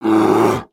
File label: Pets Bear Panda Rage; creatures; Lego